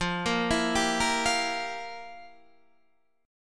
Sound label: F Major 7th Suspended 2nd Suspended 4th, F, down, Guitar, Open D